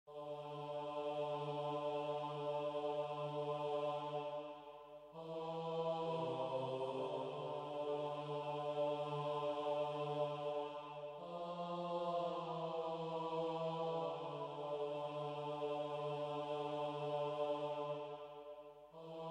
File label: Monks; Tomb Raider; Cambodia